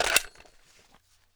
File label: m14 mag in, weapons; garrysmod